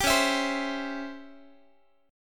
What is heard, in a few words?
Db Minor Major 7th Flat 5th Db down Guitar; strummed; 22,21,19,22,x,x